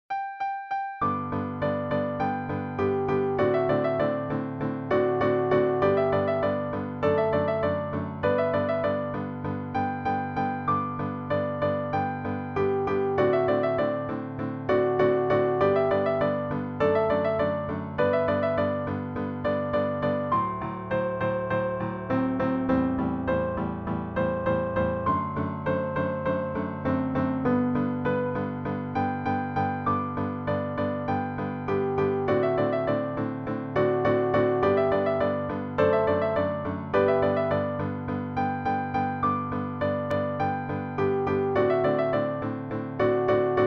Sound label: Allegretto Per Pianoforte; Kafka; Ludwig van Beethoven